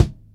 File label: PUNCH KIC, ACSTC DRUMS; Pro-Rec - Synthsonic